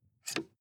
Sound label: sports car int ventilation adjust soft; verkehr transport; autos